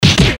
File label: fist hit seq part1